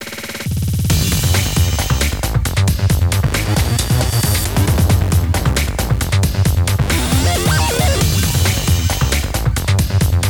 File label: Kakutou 135bpm; Dragon Blast